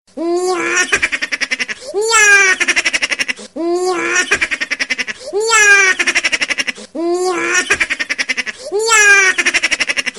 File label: Funny Laughter